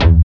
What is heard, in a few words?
BASS, Synth Bass, Roland